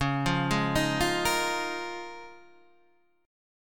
C sharp 6th Add 9th C sharp

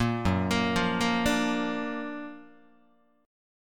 F sharp Augmented Major 7th F sharp Guitar; arpeggiated

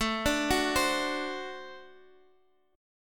D 7th, A

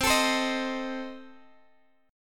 C Minor 6th Guitar Open F, chord; Cm6 strummed; x,19,21,19,18,x

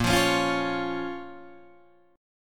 A sharp Minor A sharp down Guitar Open D

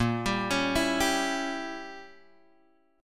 Bb Diminished 7th Bb Guitar Drop D; Bbdim7 arpeggiated

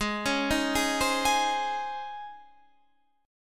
C sharp Major 7th Suspended 2nd, G sharp

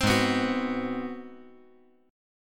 Ab 7th Sharp 9th, chord; Ab7#9 strummed; JGuitar

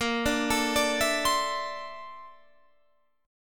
A sharp Major Sharp 11th, Guitar, Standard; A#M#11; arpeggiated; JGuitar

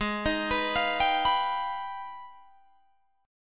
G sharp Minor Major 13th, Guitar DADGAD; arpeggiated; 18,18,21,22,22,21; G#mM13

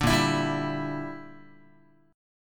Bb 6th Flat 5th Guitar, chord; Bb6b5 strummed

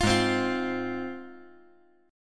G sharp 6th

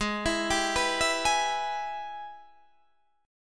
Ab Minor Major 7th Sharp 5th

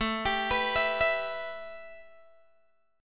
A 7th Suspended 2nd, A, down, Guitar, Open G

